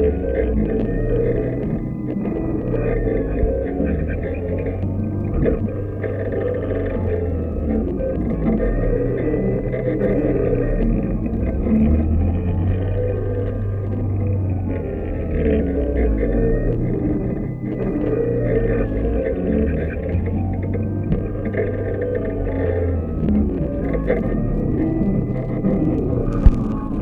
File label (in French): Moteur Piezo HF